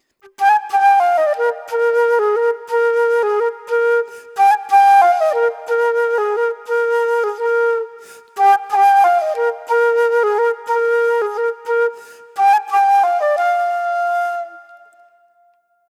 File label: 120 Am In The Woods